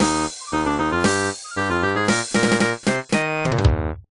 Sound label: Pigs Unlocked